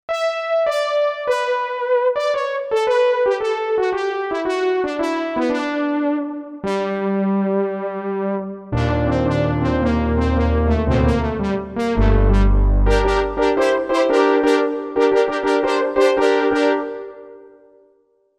Single Saw, Korg MSC-1S; Korg MSC-1S Variety

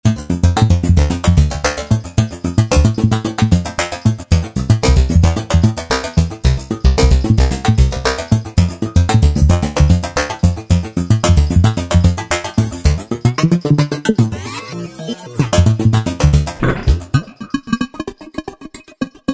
ARP Trance, Roland V-Synth; Factory Patches

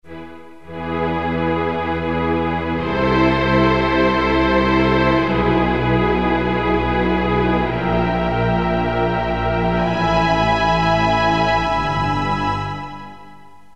Strings

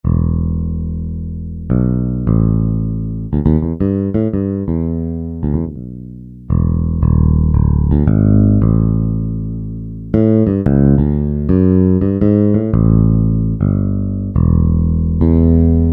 Fing, Yamaha A4000 Bass; Guitar - Bass

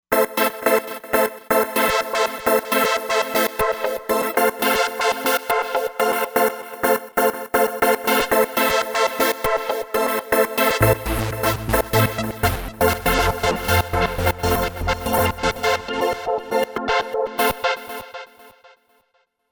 Dance, Roland Fantom XR

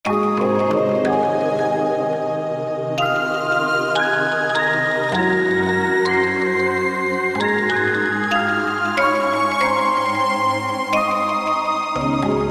Breath bell

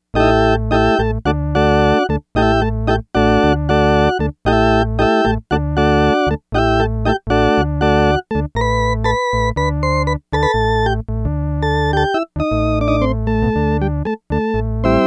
Yamaha DX100; Factory Patches; 6 Tease

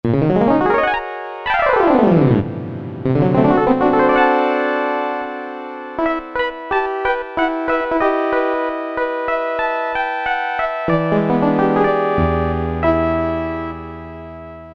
harp, Casio MT-68; preset sounds

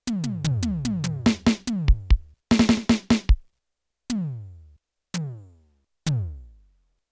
KIT THREE, Electronic Drums, Yamaha TX16W

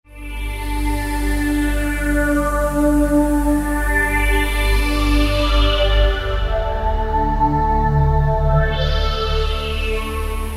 5th Sweep 2; Roland SRX-05 Supreme Dance

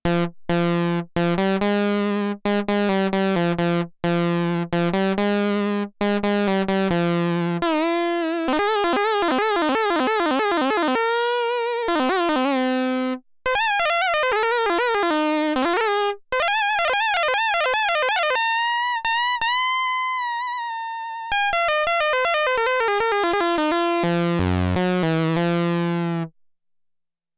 FUZZ GUITAR, Roland SH-101; Factory Patches

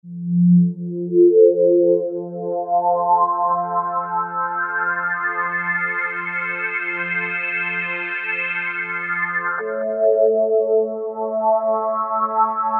Sweep Pad, Korg MS2000; Factory Patches

